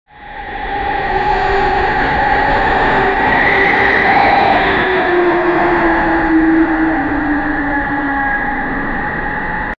creepy noise sound effect noise sounds scary

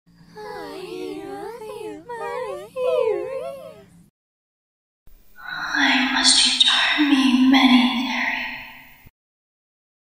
horror sound creepy little girl creepy sounds

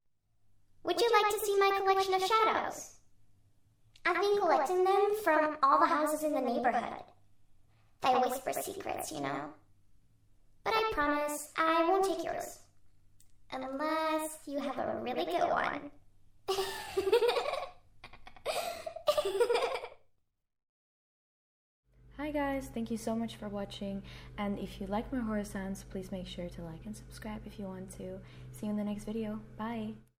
creepy little girl talking laughing scary sound

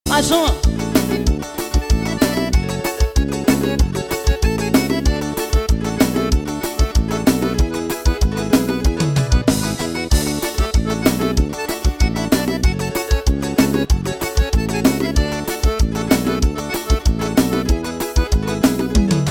forro, ao vivo; eco park tamanduá

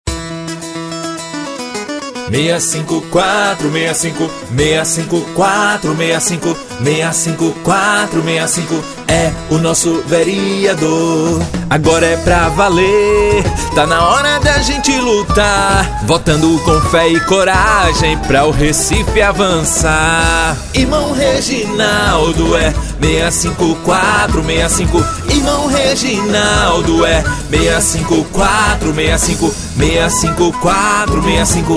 JINGLE

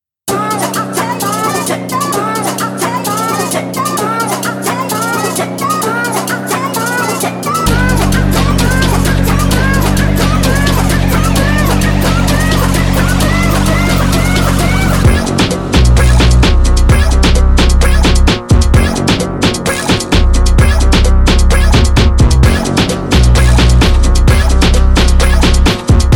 brazilian phonk

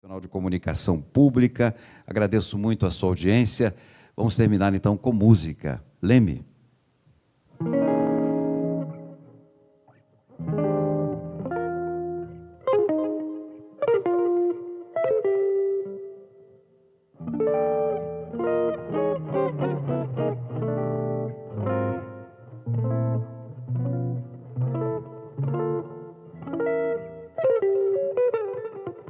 jazz; Radio MEC no Jazz Livre; Leme

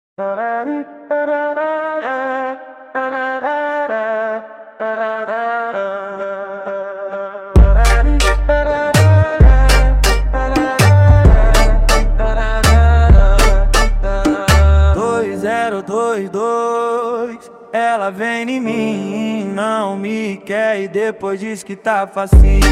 funk bass; iphone 17

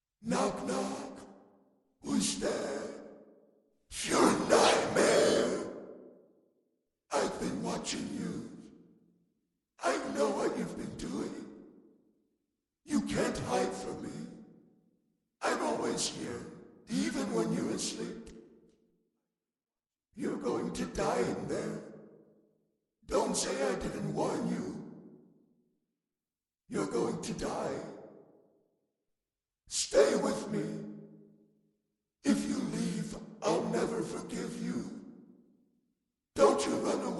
scary sounds; to troll people with in proximity chat

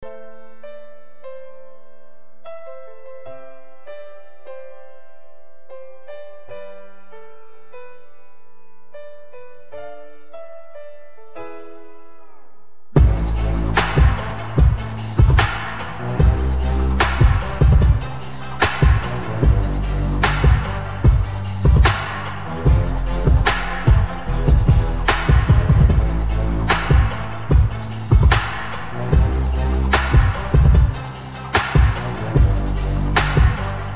hip hop rap instrumental

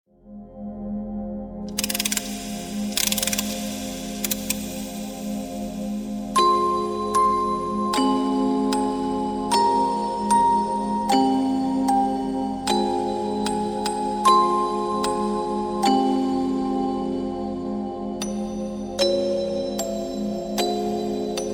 creepy music box; mary mary quite contrary

